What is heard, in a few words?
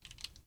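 fast reload exmag movement; gmod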